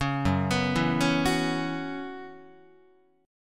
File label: F sharp 7th Suspended 4th, Guitar; arpeggiated; 4,2,2,2,5,5; F#7sus4 Chord